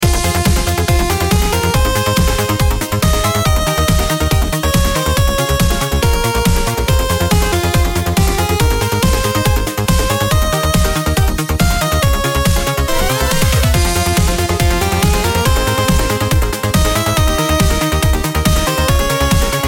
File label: arena combat; freepixel; Action